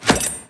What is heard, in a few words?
friendly ball pickup